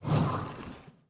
Burning Hands Whoosh Tails; Evolve; Meteor Goliath

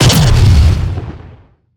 Banshee Missile Destroyed; Evolve; Elder Kraken

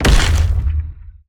Stealth Attack Land; Evolve; Behemoth

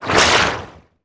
Attack Slash; Evolve; Wraith

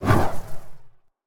Burning Hands Whoosh; Evolve; Meteor Goliath

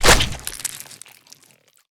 Tongue Grab Hit; Evolve; Behemoth

